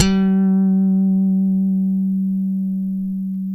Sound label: FUNK, Bass; Spectrasonics; Bass Legends